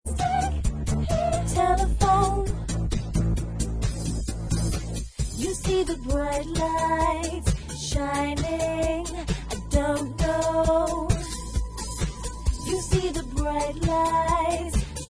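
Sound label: backing vocals track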